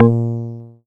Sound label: PLUCKED, Synclav FM, A#; Big Fish Audio